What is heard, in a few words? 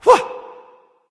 nv confused; cupid